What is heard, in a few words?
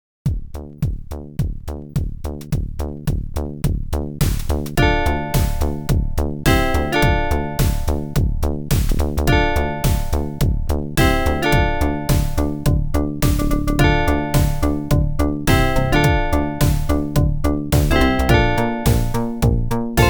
sad italo disco opl; weeklybeats 2024